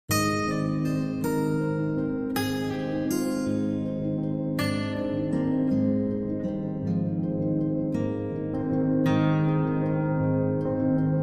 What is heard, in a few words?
Lead Guitar